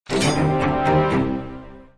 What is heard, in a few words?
system mail